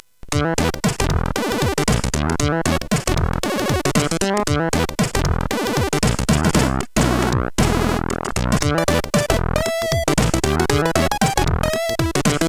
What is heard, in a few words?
Blues, CSG8580; Monkey Blues; hvsc